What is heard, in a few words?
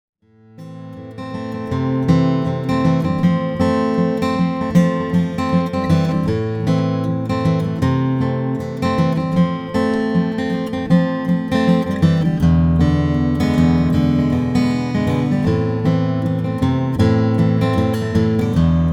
froggy bottom g limited brazilian